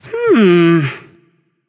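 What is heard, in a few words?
thinking, nonverbal; smite; voicepack; khepri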